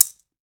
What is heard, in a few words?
Latin Shakers, mexican